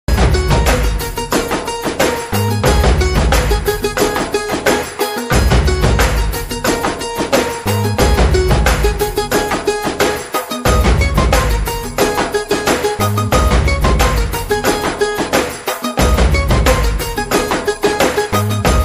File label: ringtone, music ringtone